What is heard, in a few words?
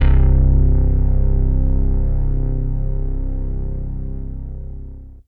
BASS Bullet (C); Melodic One Shots; Cymatics; ANALOG EVOLUTION Beta